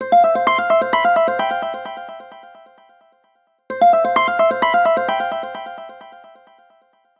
Echo Tone, ringtones; Samsung; Galaxy A5 2014

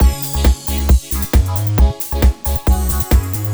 teckno; Google; NewWave Labs

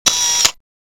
Mech. Sound Zoom In; SKY IM-S610K; Camera

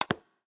Lock

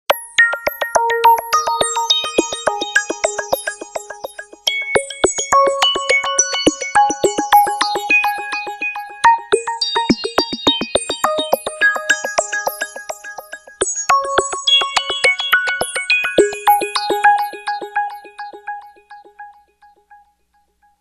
Ring Bounce; HTC S740

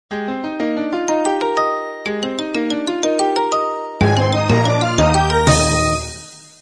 Tone; Sony Ericsson W518a

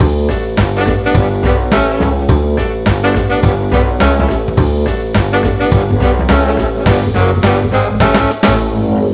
land theme loop; Nokia; 5800 XpressMusic; Bounce Touch

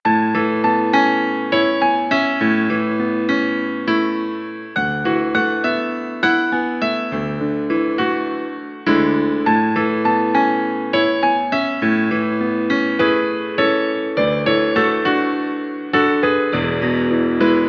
Piano, alarms; Coolpad Note-8

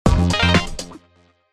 Boxed Message, House; House 1

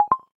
Cable Connect

